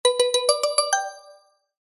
multimedia alert notification mallet musical short positive